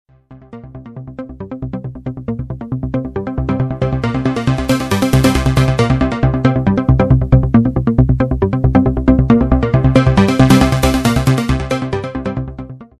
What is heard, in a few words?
nordlead main sequence, Clavia Nord Lead; Main Sequence by rico007; Sweden